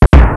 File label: ball drop